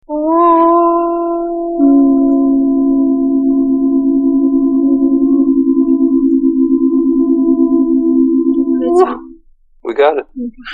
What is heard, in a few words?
tone